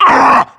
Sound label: charger pain, zombie; Left 4 Fortress